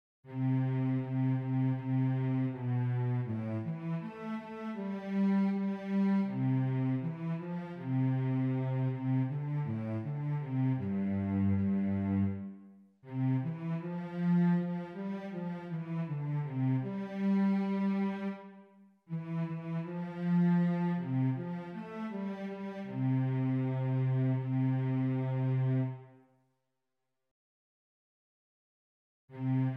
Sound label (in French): VIOLONCELLE